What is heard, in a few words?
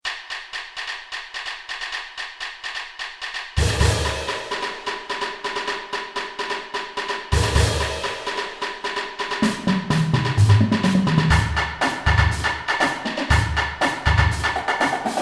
Brake Drum; marching